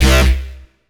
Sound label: Bass Shot, dubstep and trap; Ghosthack; Dubstep and Trap Pack 7